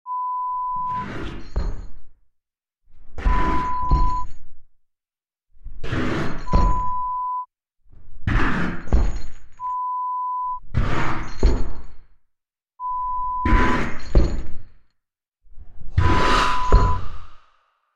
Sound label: Ghost Walk Horror Sound Effect